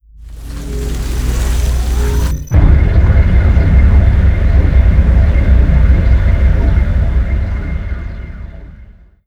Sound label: alien abduction; Stellaris; nemesis